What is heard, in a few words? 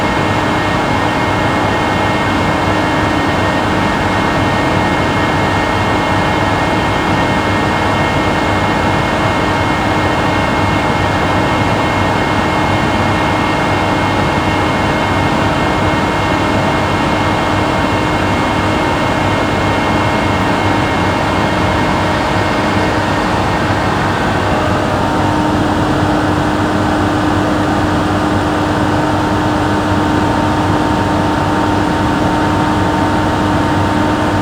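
3.6-metre telescope hydraulic, La Silla; hydraulic room; mono; La Silla ESO 3.6-metre-telescope hydraulic room; soundscape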